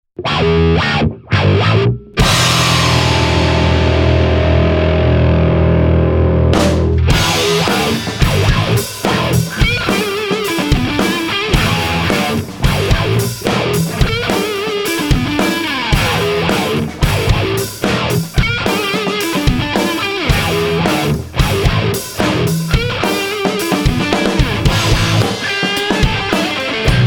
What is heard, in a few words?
Bass through Boogie with Wah